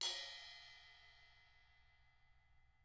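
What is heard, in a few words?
CRASH 16 BELL, CYMBAL; CYMBAL KITS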